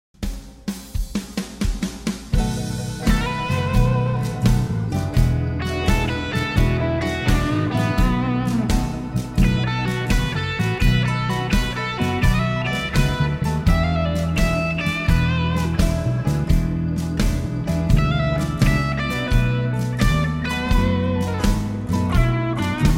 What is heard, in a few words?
blues time again